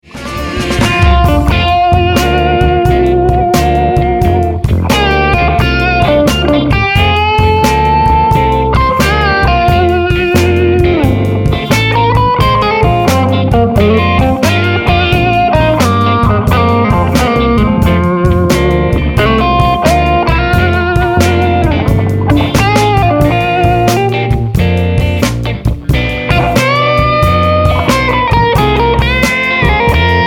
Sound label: blues